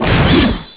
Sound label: click sound; Alger; champ manoeuvres; diaporama